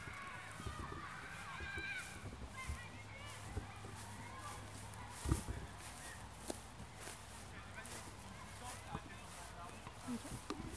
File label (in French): herbe